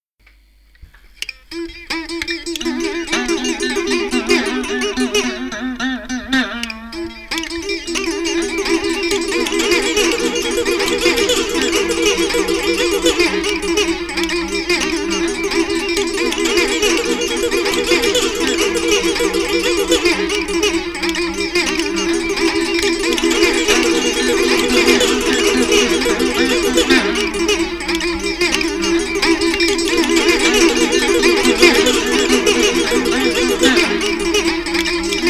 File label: Toy Guitar